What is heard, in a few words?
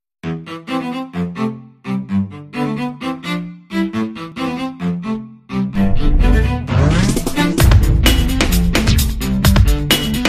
love bgm; Vinnaithandi Varuvaya; VTV; south love bgm